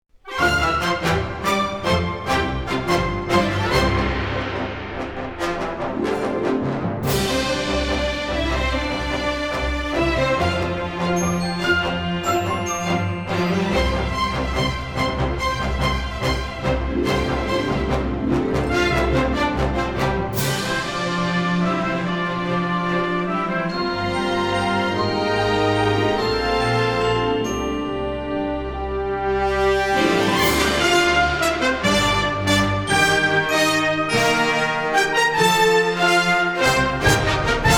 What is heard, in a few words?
news closer; CTV